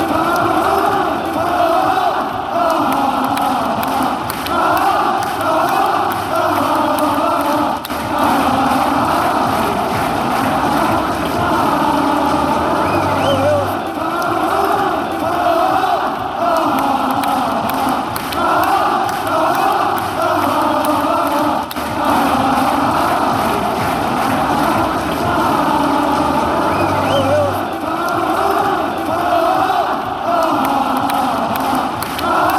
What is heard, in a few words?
ha ha fanchants